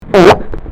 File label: fart